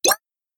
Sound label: Player jumping in a video game; nhay